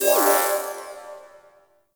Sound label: Mission UI Close; Warframe; Zariman